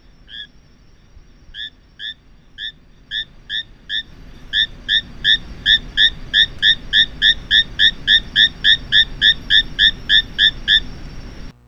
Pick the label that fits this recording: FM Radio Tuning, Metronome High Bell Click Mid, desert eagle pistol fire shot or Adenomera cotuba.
Adenomera cotuba